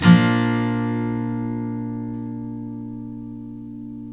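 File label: steel A# major up, CHORDS STEEL, Guitars; Spectrasonics; Hans Zimmer Guitars VOL-1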